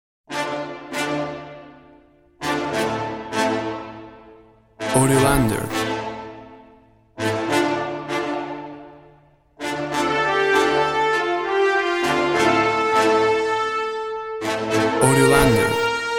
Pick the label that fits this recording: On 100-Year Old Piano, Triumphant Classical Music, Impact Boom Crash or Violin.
Triumphant Classical Music